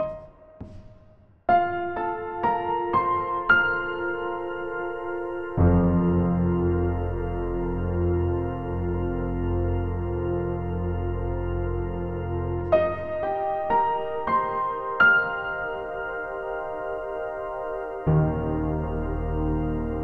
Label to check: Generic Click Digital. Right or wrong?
Wrong